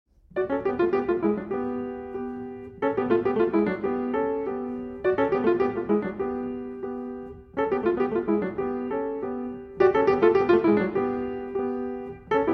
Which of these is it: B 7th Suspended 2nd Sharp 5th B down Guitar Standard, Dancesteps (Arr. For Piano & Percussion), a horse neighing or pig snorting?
Dancesteps (Arr. For Piano & Percussion)